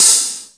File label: HH, Drum Dry; East Collexion; Drum 1 Dry